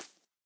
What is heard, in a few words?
hop, rabbit; minecraft; mob